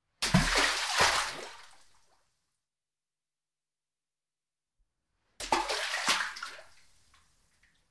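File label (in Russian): kamen padaet v kolodec, stone falls into well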